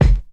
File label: perfect kick drum F key; kick drum samples